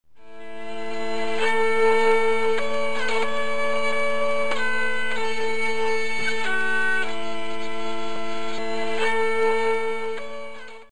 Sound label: hurdy